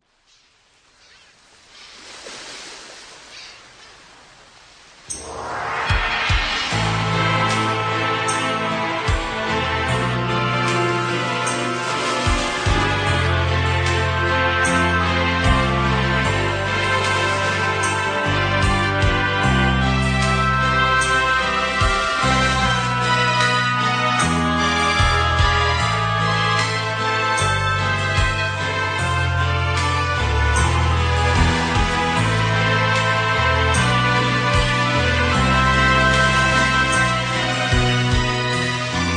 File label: instrumental